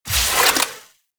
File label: Shotgun Fire Stop Mech; Warframe; Tenno; Alchemist